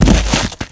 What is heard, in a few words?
Body Impact